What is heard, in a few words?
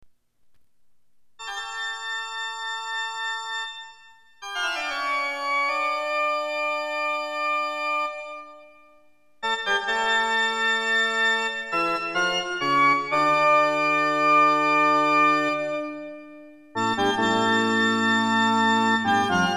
Toccata & Fugue in D Minor, Organ Music